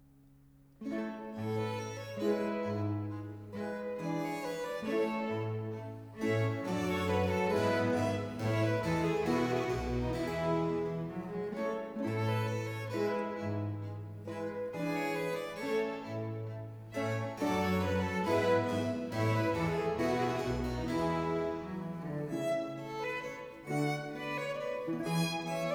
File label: Concerto grosso Sarabanda (Largo); No. 11 in B-Flat Major; Corelli; Concerti grossi, Op. 6